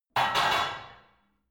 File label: barbell only replace reverberant hard; sport freizeit; fitnessstudio